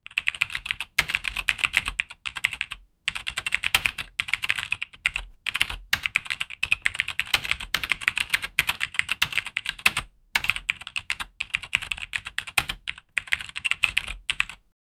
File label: thocky keyboard typing